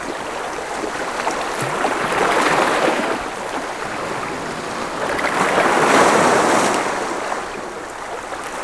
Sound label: waves; tortuga